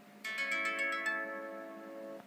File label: impact